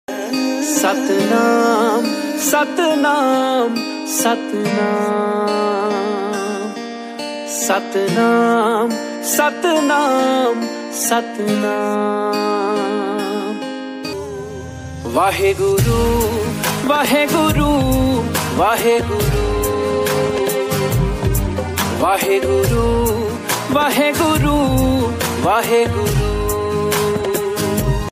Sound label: Satnam Waheguru Punjabi Dharmik